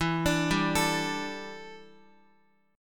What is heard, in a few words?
E Minor 11th guitar chord; Em11 arpeggiated; JGuitar